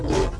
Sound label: leopard menu popup